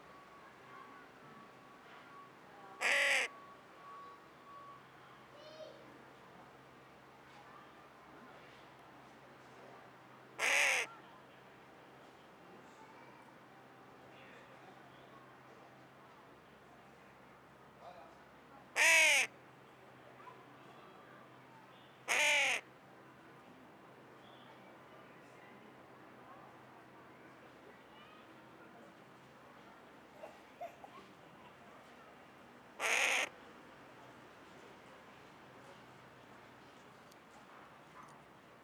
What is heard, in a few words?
Corvus corone; SETE